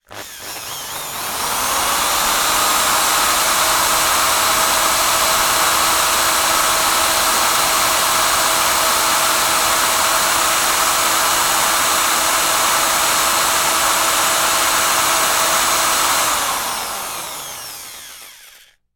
industrial drill short bursts then med long burst free wheeling in air